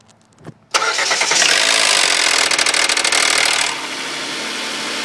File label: 3.5l mpi engine start-up noise (before repair)